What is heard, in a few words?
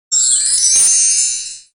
win